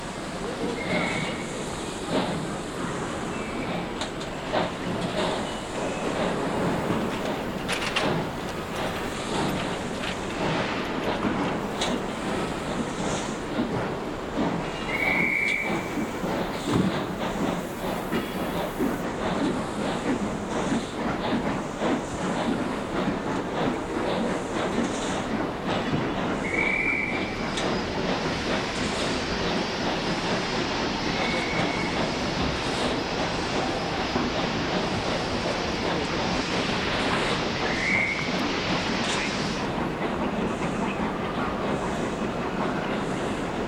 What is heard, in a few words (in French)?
boucle grande gare traffic trains vapeur; terreur sur l'orient express